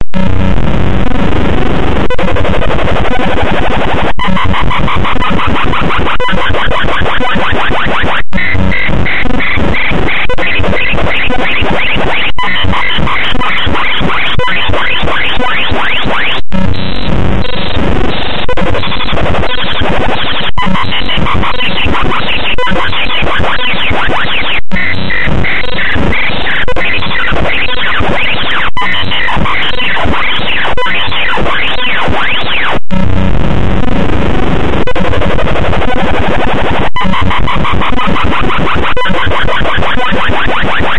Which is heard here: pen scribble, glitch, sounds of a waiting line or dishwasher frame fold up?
glitch